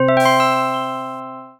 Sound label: gift crown